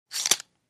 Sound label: Safety Belt Click, seat-belts; accessories